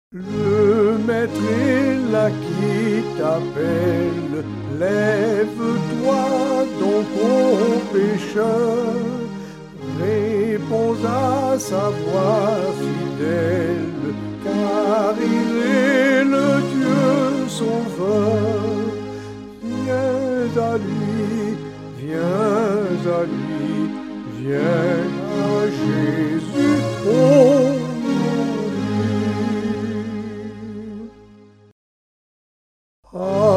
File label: Soprano, Cantiques